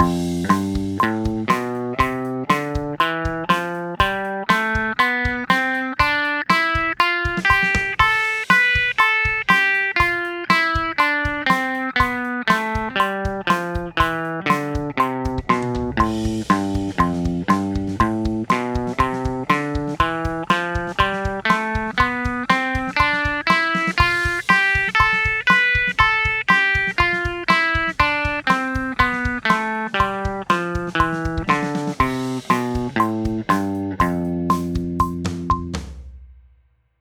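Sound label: A minor #6 Lydian 120 bpm quarter, guitar; a minor diatonics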